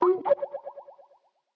INCOMING FILE; skype

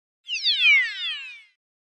Comic Slide Short Going Down; Hollywood Edge; sound designer's toolkit v2

